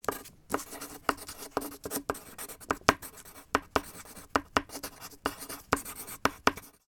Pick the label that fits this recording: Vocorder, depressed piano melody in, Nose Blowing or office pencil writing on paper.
office pencil writing on paper